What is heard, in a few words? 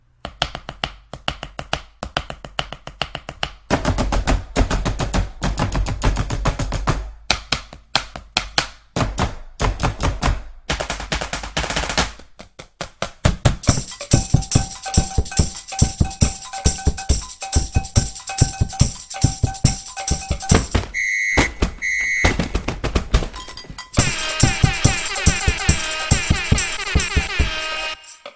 samba